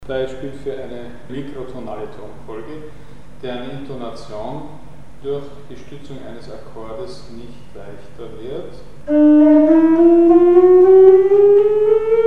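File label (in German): Mikrotonfolge deren exakte Intonation durch Akkordstütze nicht leicht; Mikrobuch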